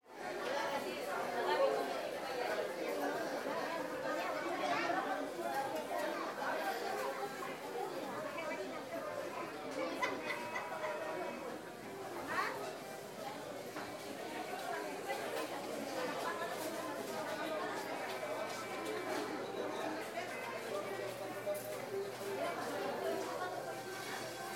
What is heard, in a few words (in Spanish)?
MERCADO LA UNIÓN TRUJILLO AMBIENTE INTERIOR; vendedor; Vendedores